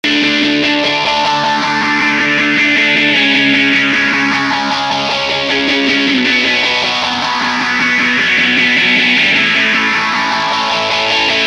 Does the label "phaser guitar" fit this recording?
Yes